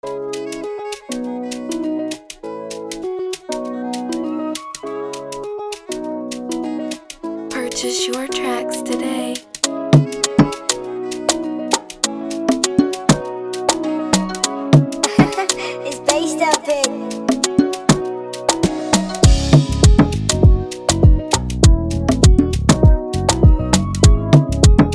Free beat 100bpm E major

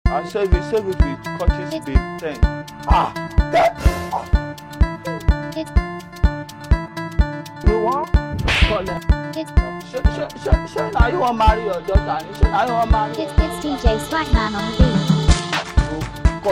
instrumental

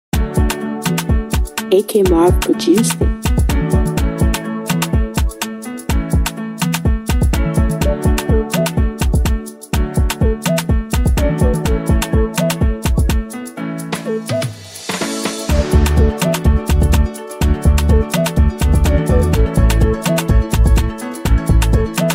Afro Beat x Zanku Instrumental

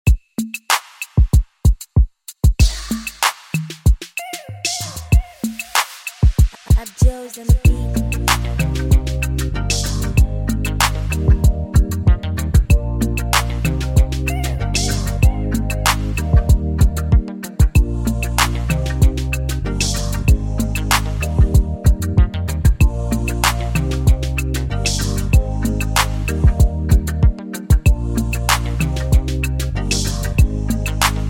Instrumental

